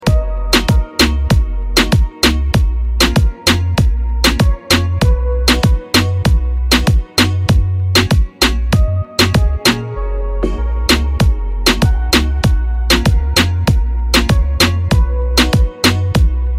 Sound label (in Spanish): instrumental